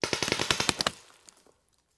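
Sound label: Paintball Gun Impact; Sound Ideas; Guns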